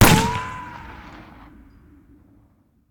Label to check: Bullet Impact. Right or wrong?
Right